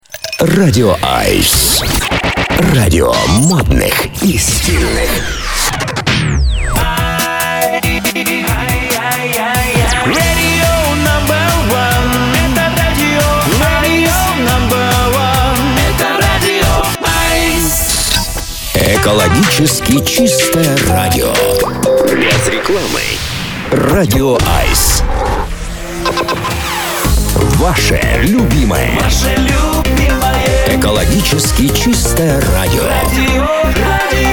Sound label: Jingles Radio; efir